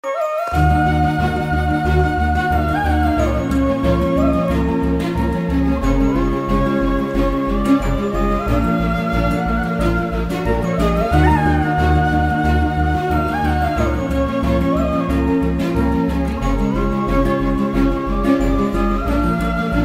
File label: theme song ringtone; son of satyamurthy